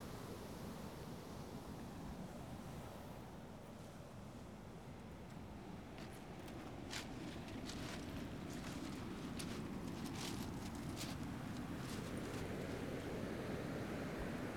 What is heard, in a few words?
ocean walk up